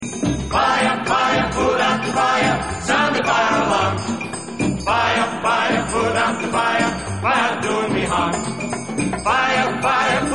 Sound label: Fire Alarm